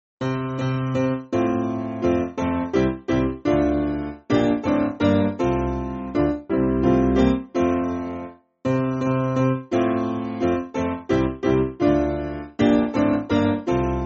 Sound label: Piano, church music; Christ Has Arisen; M Furahini H